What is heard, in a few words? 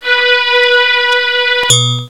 MIRAGE; Rubber Chicken Software Sample Bank; OLD SYNTHS; OTHER OLD